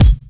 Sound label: KICK, DRUM HITS; Loopmasters; The New Sound Of Dubstep